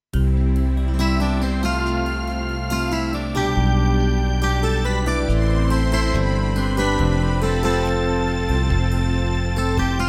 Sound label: Yamaha Styles; Arranger; EMC Styleworks